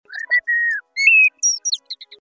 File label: Bird Wave